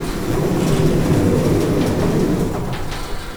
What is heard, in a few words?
elevator door close